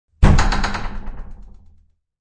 Door Bust Open, Busting open a locked door; Breaking; Objects

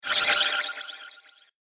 Swish And Squeak; Whoosh Sound Effects

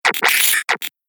Robotic Mechanism, sci-fi sound effect; Robot Sound Effects

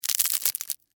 Crunch Short Crack, Wood, Basket; Texture; Crunches; BlastWave

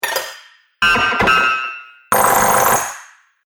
Robot Factory Noises; Robot Sound Effects

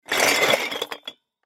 Christmas Bulb Bin Move Shake; Rustling; Foley

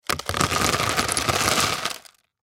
Ice Pour Into Container From Plastic Cup; Food; Household; Blastwave

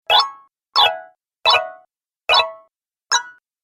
App Menu - Five Sounds, Ping; Multimedia

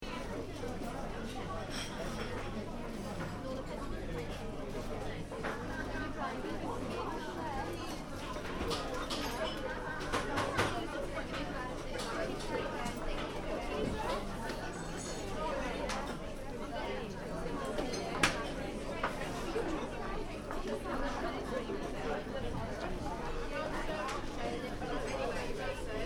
Interior cafe; Café, Interior: Ambience; Cafeterias; Backgrounds; Stuart Duffield

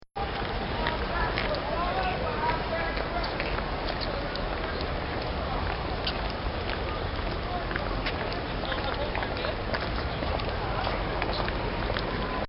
Walking, footstep; Footstep Sound Effects; Human; recorded in a custom built sound booth

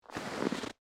Snow Single Slow, Footsteps; Footstep Sound Effects; Footsteps (Sound Effects:Human); BlastWave

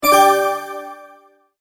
multimedia tone start

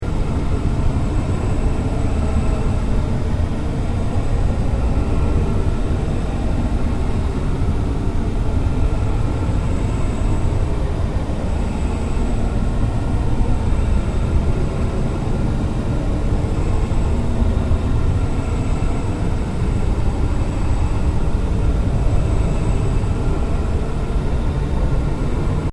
Ambient Drone No Sweep; Sound effects created by manipulating other sounds; Sound Design

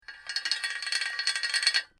Metal Chain Pulley Rattling Chains; Chain sounds

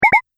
Multimedia Double Button

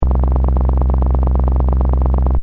Sci fi machine loop, Scifi Machine: Loop, Short

